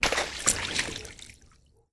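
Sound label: water drop strong plop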